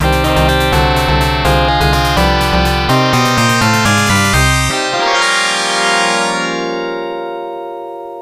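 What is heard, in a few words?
background music; Section Z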